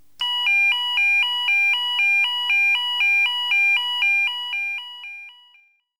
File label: Tone Alternating 800-970 Hz at 2 Hz (250ms-250ms)